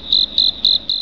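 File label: cricket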